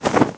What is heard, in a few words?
Jump out; DS; NEWSMB; World Map